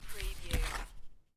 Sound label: Playing Cards Gather Cards From Table; Casino / Poker, Cards & Dice